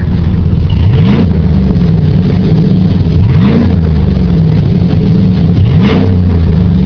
chevy 1500 6.0l split rear; Chevy/GMC

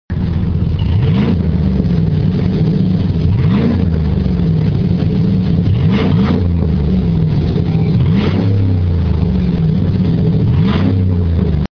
Silverado Vorteo Max 6.0L Split Rear

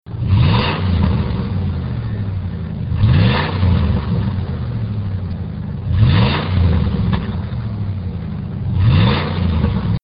Aviator 4.6L Swept Side

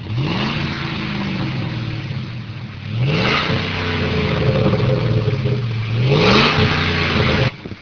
ford f-150 5.4l single 3 inch

